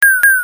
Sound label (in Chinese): 卡通